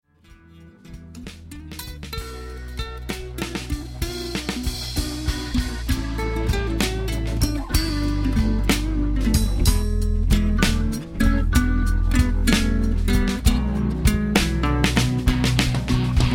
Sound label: Broken String